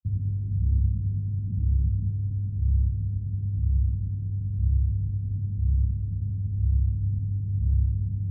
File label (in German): pulsierendes brummen